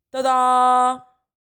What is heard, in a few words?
Tada